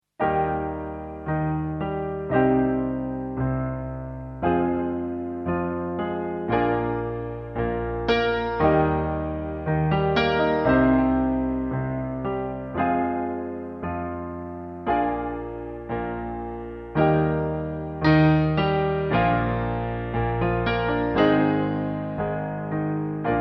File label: piano